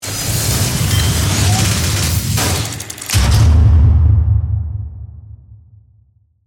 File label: Mechanical Transform, Transforming, Robot; Imaging Element; Accent; Transition; Spacecraft; Production Element; BlastWave